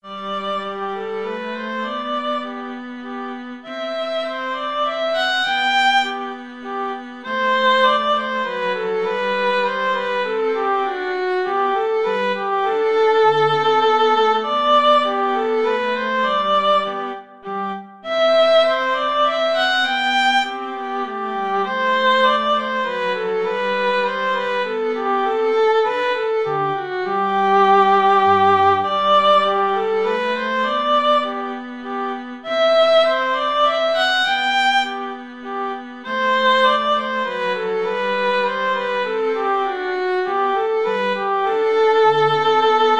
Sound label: violin cello duet